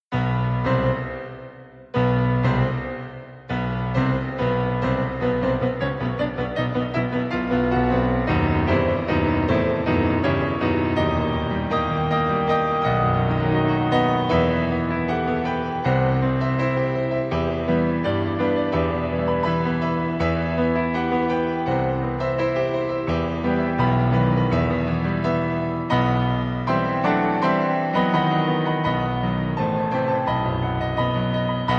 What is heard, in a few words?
Symphony 4th Mov. Piano; Dvorak; New World